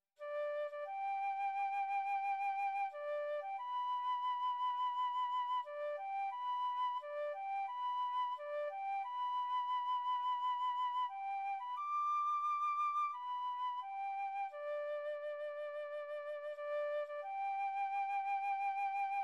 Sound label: taps, tin whistle